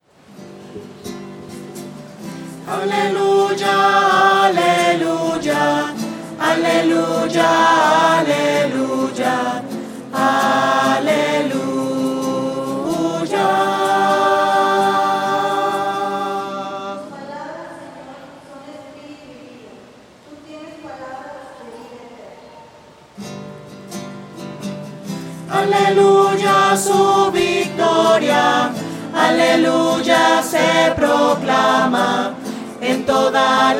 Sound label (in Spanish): aleluya su victoria voces